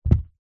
wood small heavy dense piece drop light carpet thud; foley